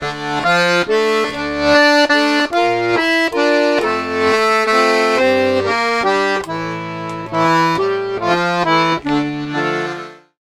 140, Complete Accordions

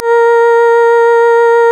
ORGAN, A#; Big Fish Audio; Synclav FM

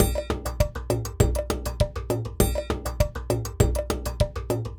Ensemble, Latin Percussion

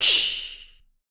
CYMBAL, MALE PERC

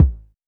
Polite kicks G#1, Short; LIMAT Div Dept; Drums

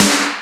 Roland R-8 Snare, Drums

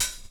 DR. LOOP; X-Static Goldmine 3

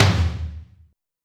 tom, Zoom MRT-3B

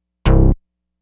Purple Bass; Dr. Fink's Funk Factory

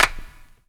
CLAP, Dance Drums; Best Service ProSamples vol.15; AKAI

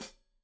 Hat Foot, Hi-Hat, Cymbals Stereo

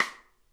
snare, drum kits

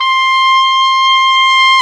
OBOE, mf f; Roland L-CDX-03 Disk 1; Oboe 2&3

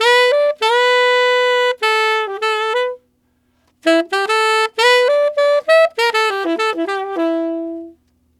Tenor Rock in E7, Tenor Sax Loops, Jazz; Sonic Foundry (Sony Creative Software); Jazz Solos and Sections

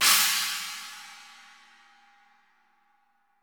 CHINA, KLANKY KIT; Spectrasonics; Burning Grooves CD2

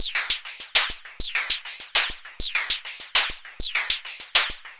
perc 100 slip slop, Percussive Loops 100 bpm; Sample Magic - Transmission-X

